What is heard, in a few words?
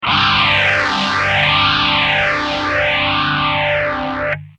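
guitar, Mutron phasor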